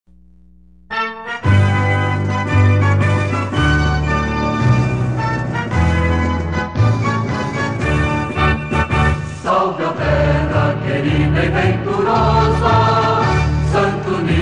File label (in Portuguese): hino